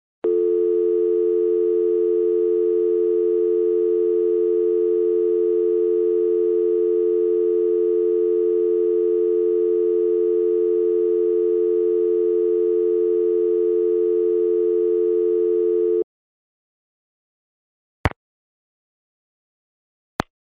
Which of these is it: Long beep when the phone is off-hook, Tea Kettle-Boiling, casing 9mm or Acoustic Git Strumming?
Long beep when the phone is off-hook